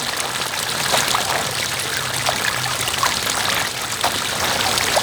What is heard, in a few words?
Kraken Tentacle Drip; Pirate; Warframe